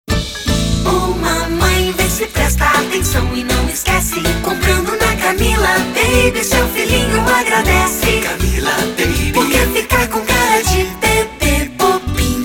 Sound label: jingle